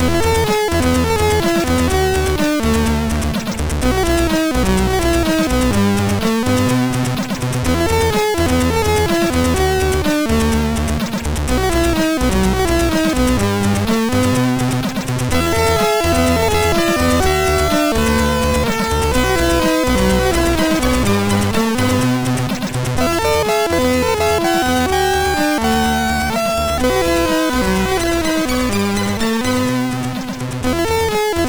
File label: sid MOS6581R2; Space Harrier II